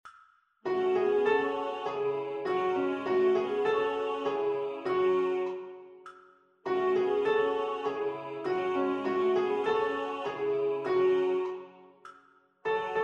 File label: Soprano Piano; Martin Prend Sa Serpe